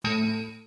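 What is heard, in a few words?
error sound Windows Vista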